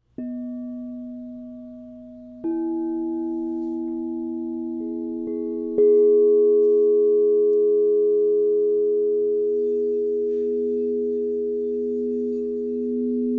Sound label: singing bowl sets; pallas set